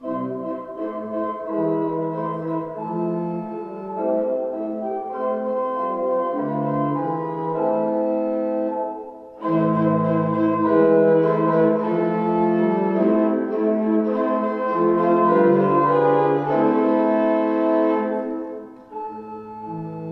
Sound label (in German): Orgel Eingangsstück; ev-kirche-rothenburg-ol